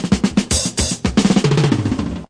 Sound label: 108 bpm 70s tv show intro